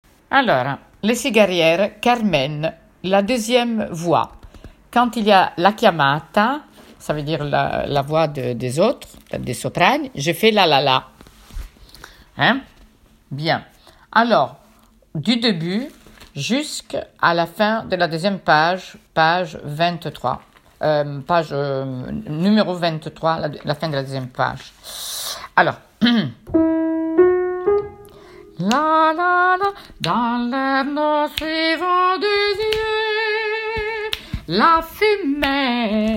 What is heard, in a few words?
alto; cigarières